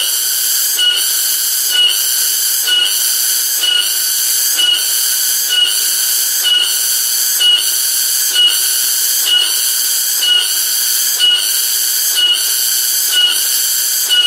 Suara Tonggeret

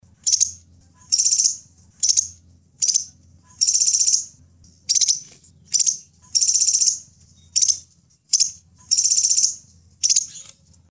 Suara Burung Kolibri Sepah Raja

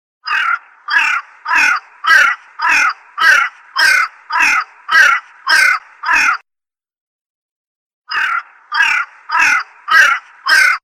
Suara Burung Gagak

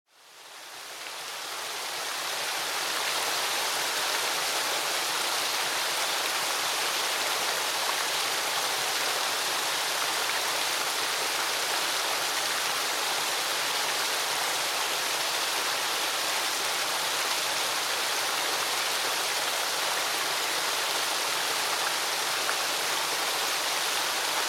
Suara Air Mengalir; untuk Terapi Burung Macet Bunyi

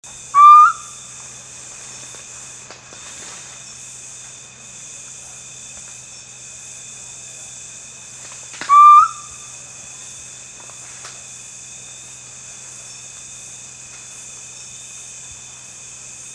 Suara Burung Hantu

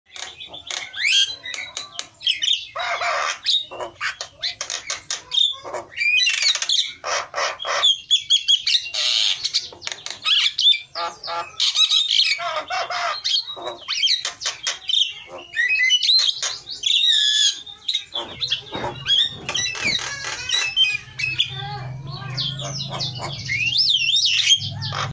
Suara Burung Jalak Kebo

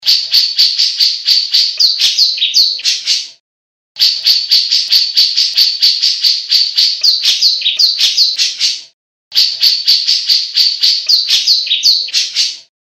suara cucak jenggot isian

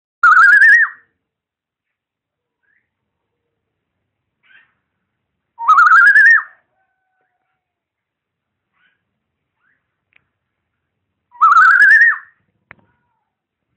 Suara Burung Kepodang Gacor; Durasi Panjang untuk Masteran

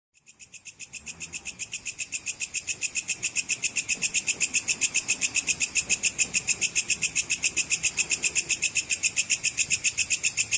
Suara Belalang Kecek; untuk Masteran Burung

